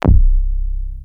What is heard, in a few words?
Access Virus B; Synthworx